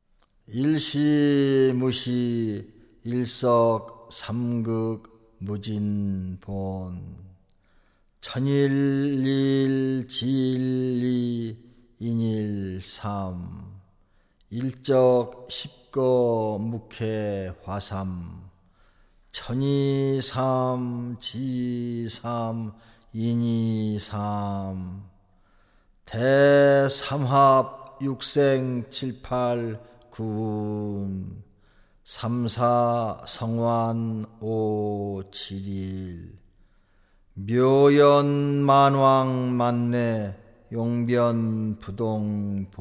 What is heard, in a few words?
Chant